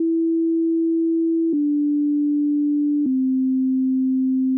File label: cde reversed; zingaro song generator